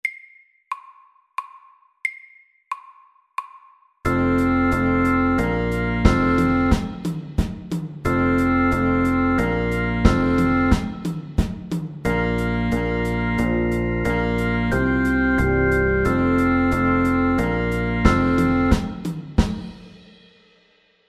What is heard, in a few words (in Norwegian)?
Fusion SAKTE